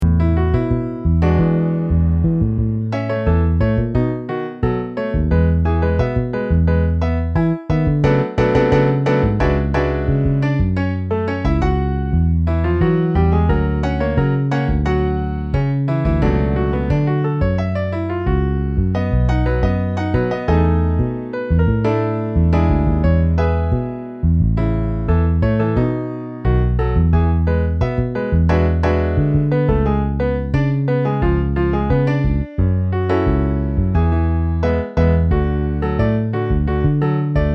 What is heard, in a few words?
Piano Bass